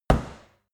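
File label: step heavy stomping, footsteps; schritte; menschen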